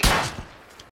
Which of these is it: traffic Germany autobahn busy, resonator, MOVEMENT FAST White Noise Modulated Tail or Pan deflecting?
Pan deflecting